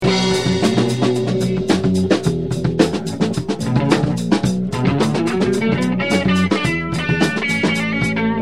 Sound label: deep funk